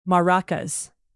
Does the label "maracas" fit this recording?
Yes